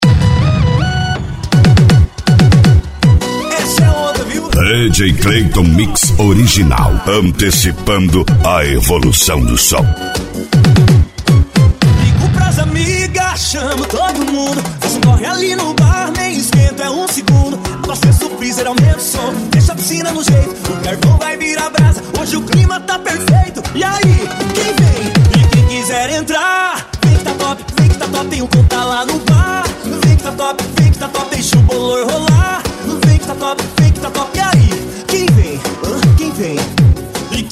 sertanejo universitario e sertanejo pancadao

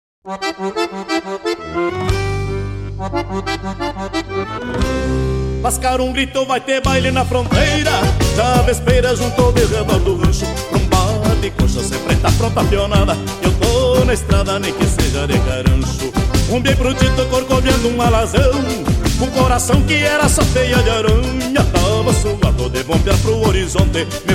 batidão gaúcho sem vinheta

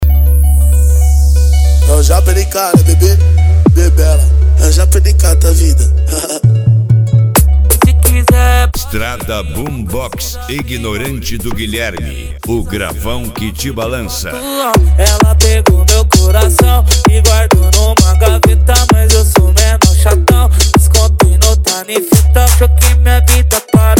esp funk atack

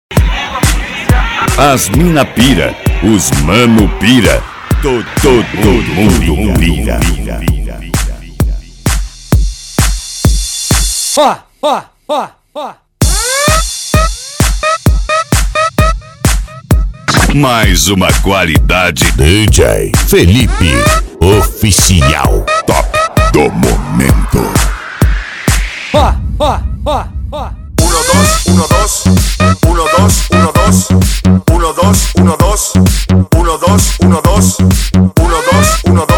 eletro funk pancadao

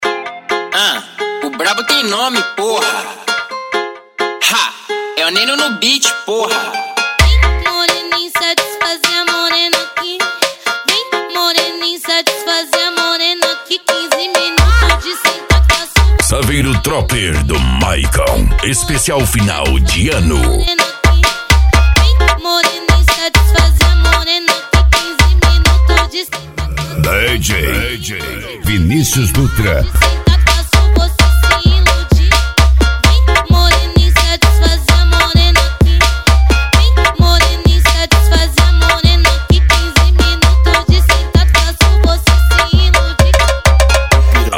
funk agressivo funk

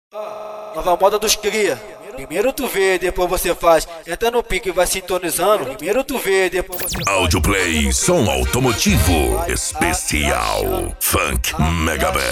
esp funk mega bass

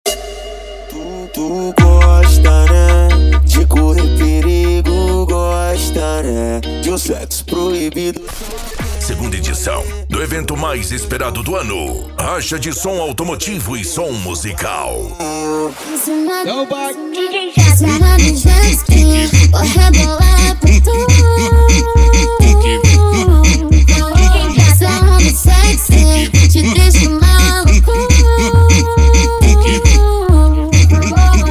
racha de som automotivo e som musical

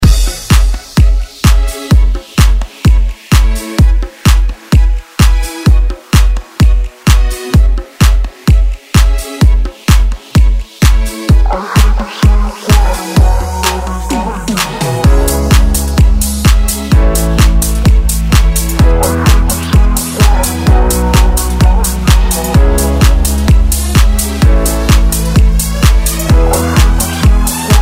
dance e funk retro; branco som

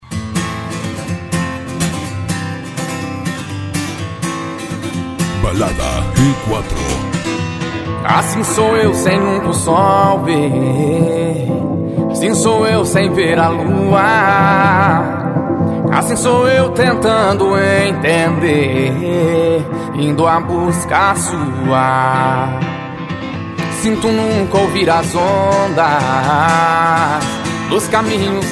arrocha, sertanejo

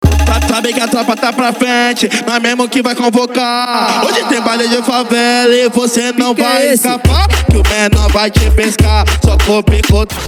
dance e funk mega bass

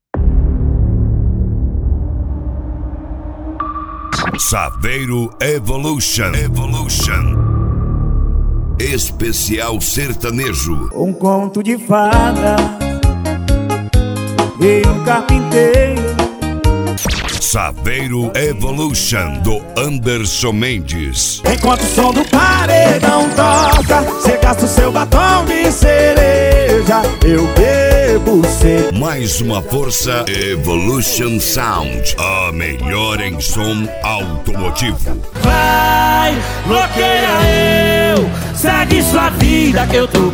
sertanejo

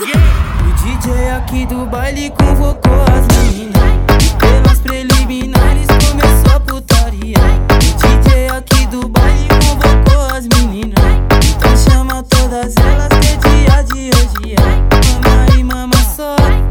hip hop mega funk

